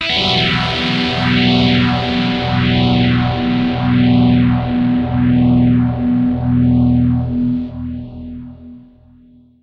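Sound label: dirty guitar stereo polyphase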